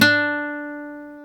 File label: GTR Nylon, Roland; 90's Sample CDs; Club-50 - Foundations Roland; Nylon Martin; Nylon 1